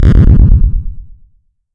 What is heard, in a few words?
cannon; Republika Exhibtion Games; luftrausers